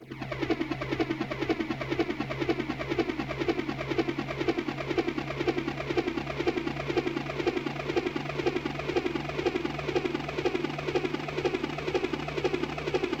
helicopter tonleiter

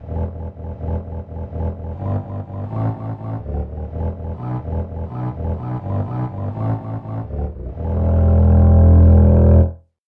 fiddle bass

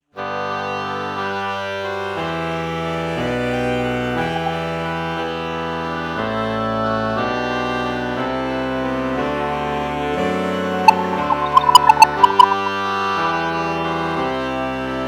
alto saxophone oboe morph

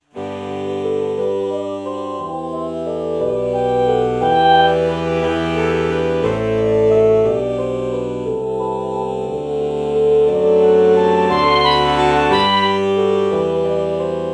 alto saxophone oh morph

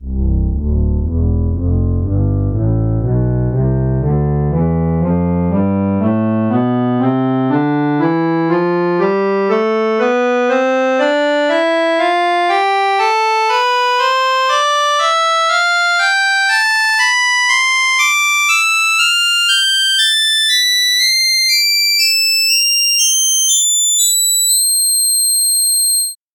reed organ; tonleiter

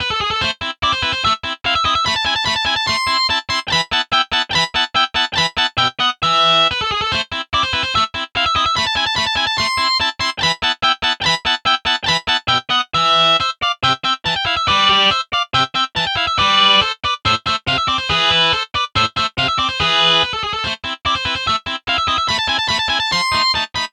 distortion guitar

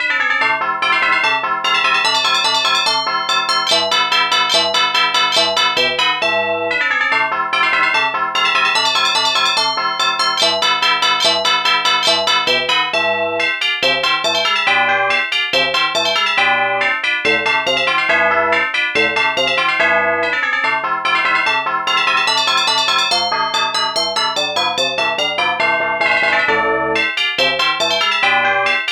tubular bells

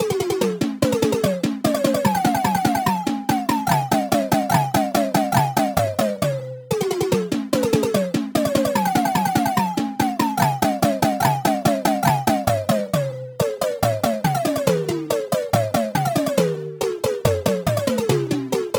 melodic tom

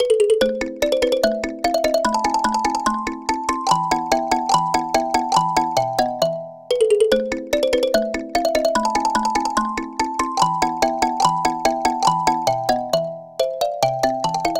kalimba

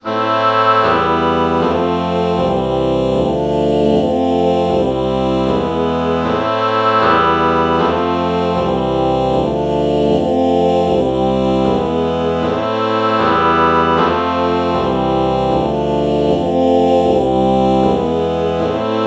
oh oboe chord morph

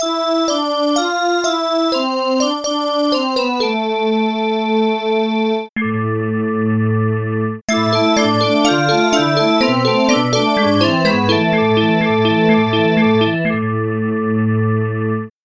bowed glass

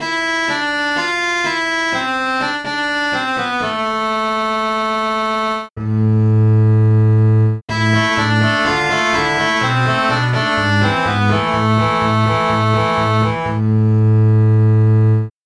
contrabass

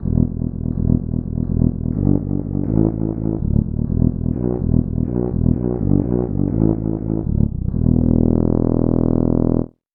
trumpet bass

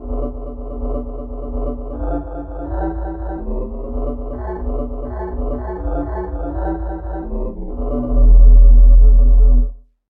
bottle chiff bass

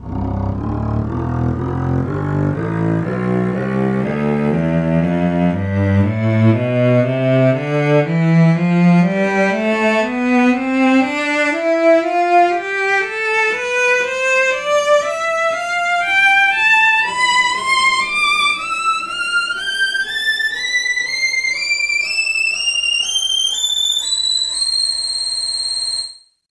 cello tonleiter

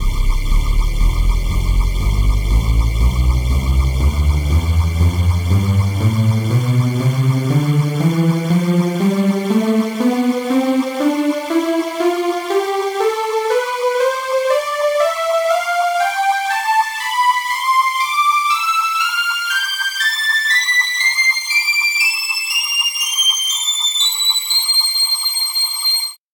polysynth; tonleiter

